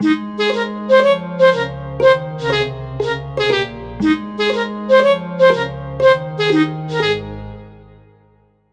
Baroque tone

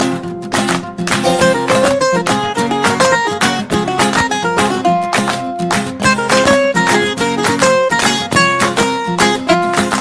Ring Tones; Chile

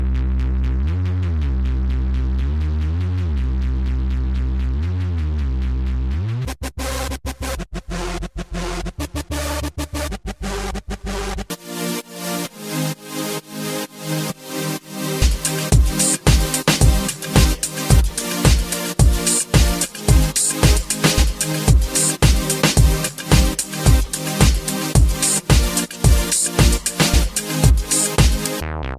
Electronic Mix

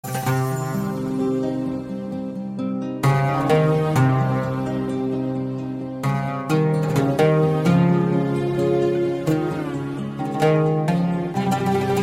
Sad musique

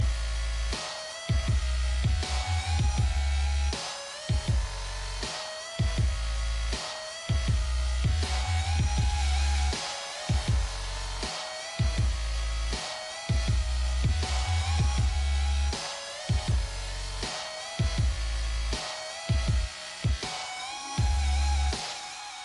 Rocking Cool Beats

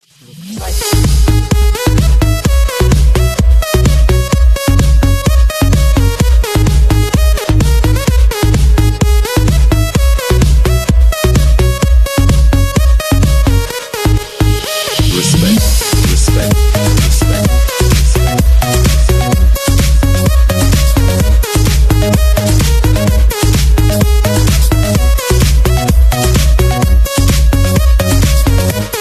Electro Freak

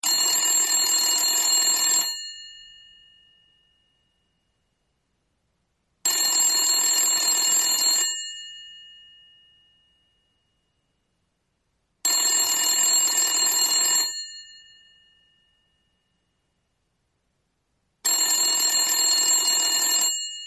Old phone

